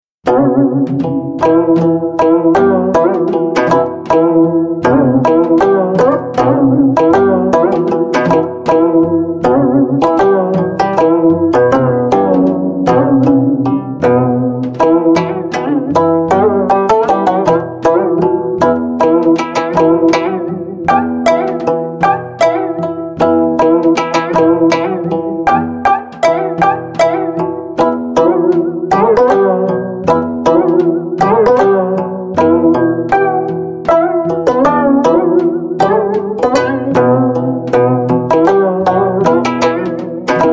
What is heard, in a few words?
gayageum